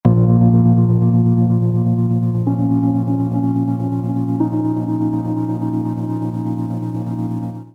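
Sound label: synth pads Bb minor; Tagavaka x Basic Wavez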